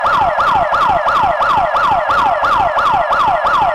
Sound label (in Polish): syrena